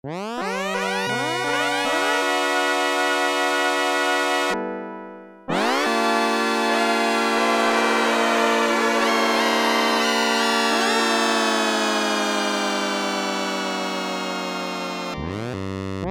3 WAY, Oberheim OB-8; Factory Patches

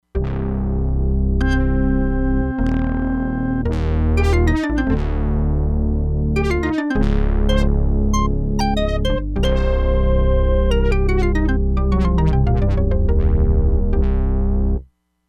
ORGAN BRASS, Crumar Bit 99; Factory Programs

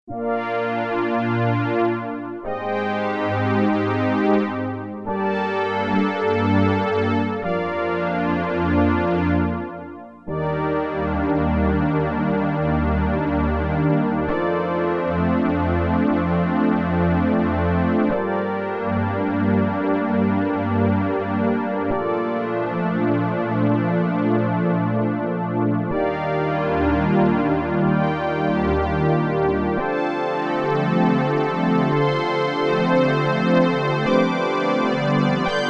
DELAYED OCTAVE, Oberheim OB-8; Factory Patches

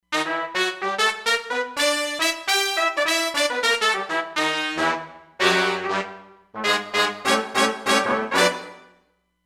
Full sForza, Roland Fantom XR

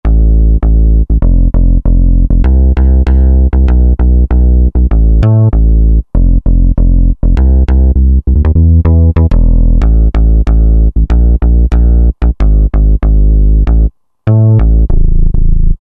Systm700 Bs2; Roland SR-JV80-04

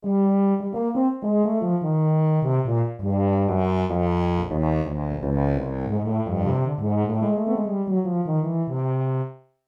Tuba&Horn Unison; Yamaha TX16W

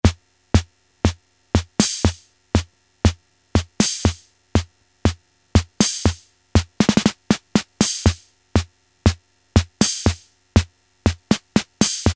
tango, Casio MT-68 rhythm

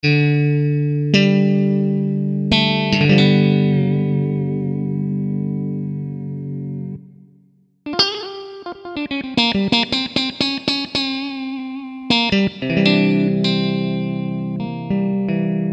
Clean STR; IK Multimedia; SampleTank Free

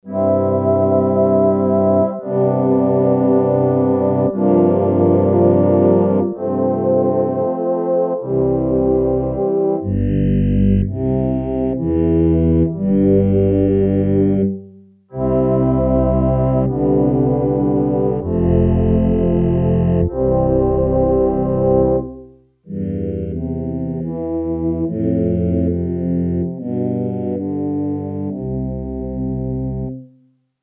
Male Vocals, Yamaha TX802; Performances; Factory Patches

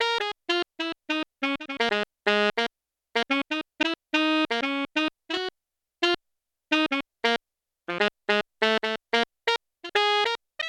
Alto Sax, Yamaha TX16W; Alto & Soprano

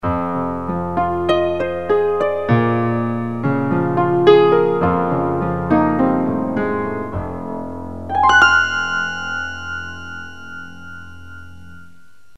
Keyboard

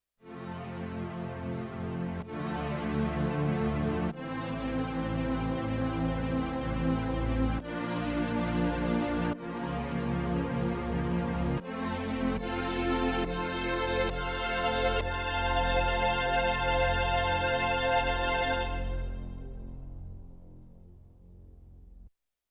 Soft & Gentle-Lush, Strings; Yamaha TX16W

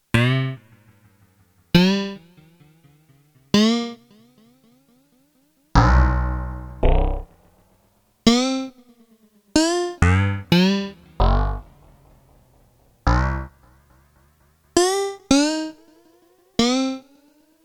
SPACE SYNTH II, Korg DW-8000; Factory Patches

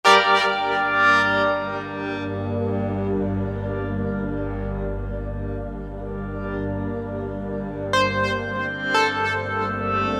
Pikloop, Yamaha TG77; Factory Presets

